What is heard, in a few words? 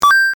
Coin